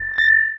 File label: nav buoy